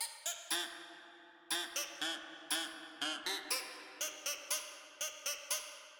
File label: Duck music; miside demo windows gamerip 2023